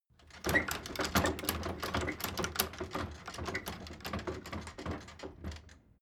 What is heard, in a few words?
door flap loft rattle